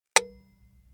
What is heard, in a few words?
metronome